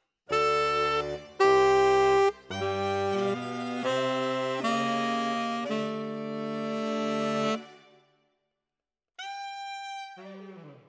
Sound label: Tenor, RNR for piano